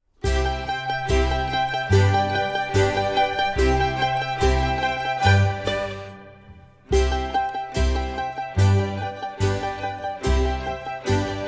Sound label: Vivaldis Ukulele Concerto in C Major; Classical Ukuleles A Collection of Favorites for Young Players